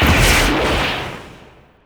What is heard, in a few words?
bazooka fire; WAR